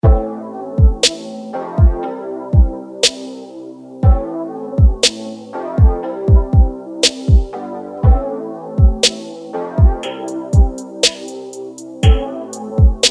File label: dreary beat 120bpm